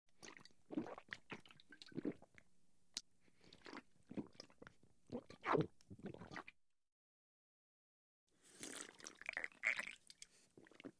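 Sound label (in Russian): пьют пиво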